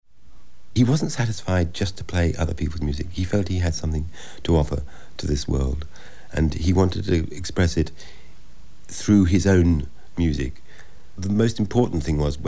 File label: contrast quartets